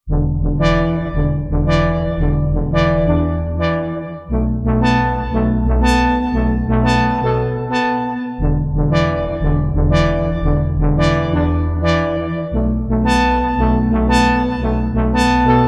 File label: reso wobbler